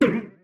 bomp

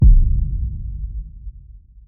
power Click

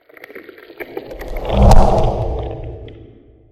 cookie Break

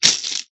till

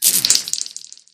freeze Garden

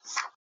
page

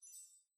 level Prestige

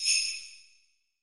jingle Click

